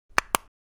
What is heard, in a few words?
Infographic button